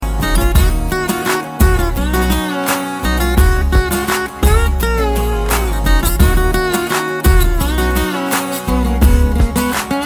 Heart Touching Tone

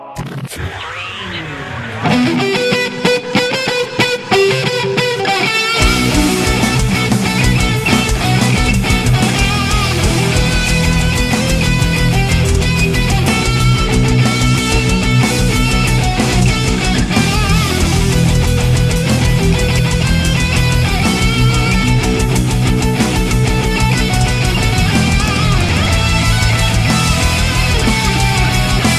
Mariachi

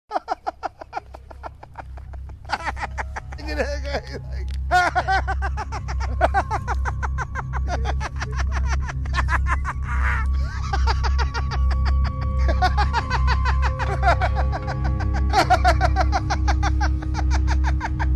Hahahah

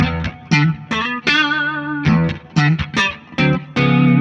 Guitar Slap

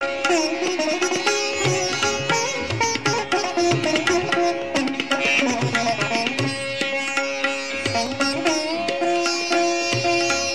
sitar

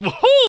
Banjos

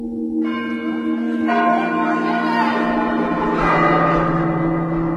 Bell; Donnie Darko

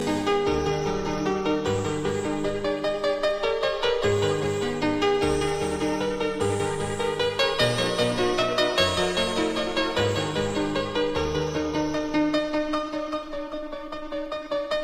Fur Elise Remixx

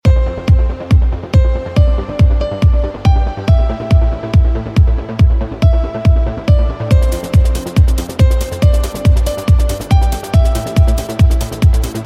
Trance Remix; JonTron

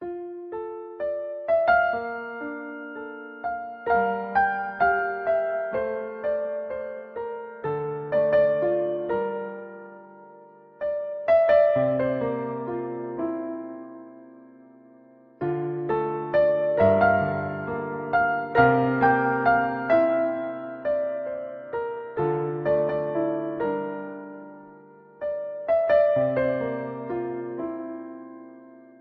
Sad Feeling

